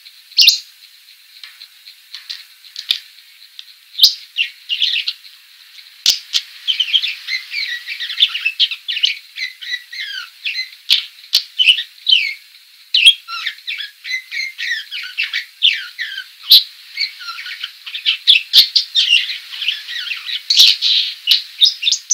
suara burung parkit betina

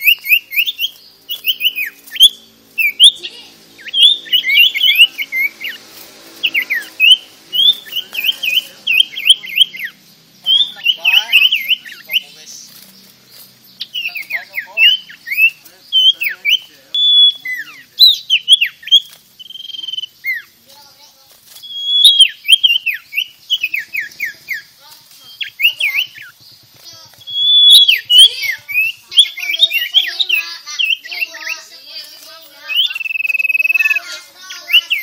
Suara Burung Sirtu Auto Gacor

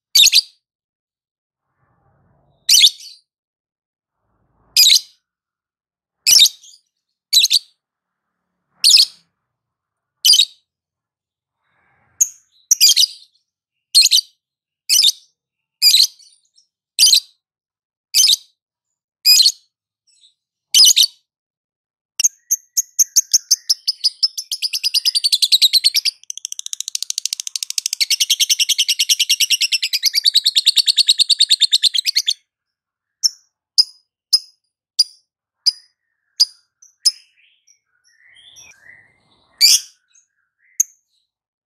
lovebird ngetik ngekek panjang

